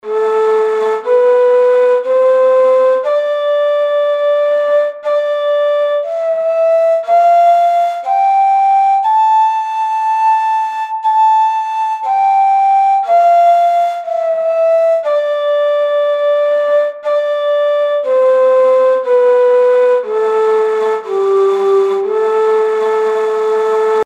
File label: ney uşşak beyati